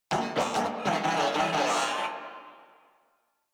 Moa Vocal Idle, Enemies; Warframe

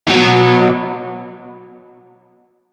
Shawzin Chromatic Chord L; Music; Warframe; Narmer Shawzin

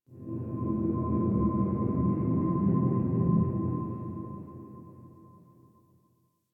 Ship Energy Low Texture Large; Ambience; Warframe; Corpus Ship Remaster

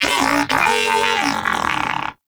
Player Down, Moa Pet Tenacious; Warframe; Pets

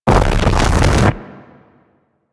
Eruption Build Up; Sargas Ruk

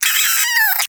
Vocal Pain, Hunting Critters; Warframe; Cryptilex

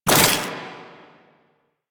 Scrap Pickup; Warframe; Missions; Zariman; Zariman Assassinate

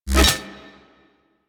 Fishing Spear Line Retract Catch; Warframe; Venus Fishing Spears

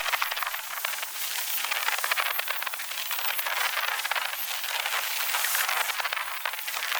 Flying Mouth Proj Loop; Warframe; Man In The Wall

